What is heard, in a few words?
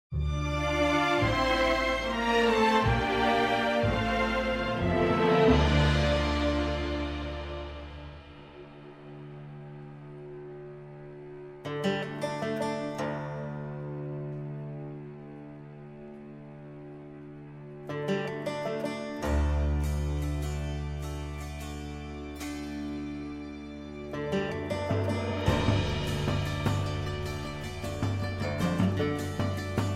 Music; Age of Empires III; cinematics